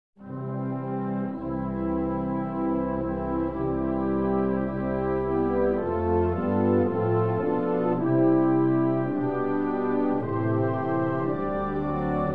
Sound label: eternal father strong to save instrumental